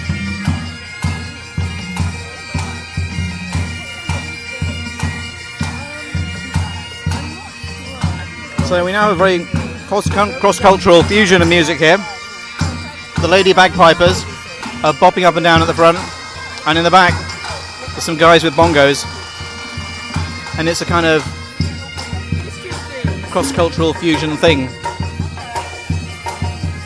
bagpipes and bongos in the Singapore botanic gardens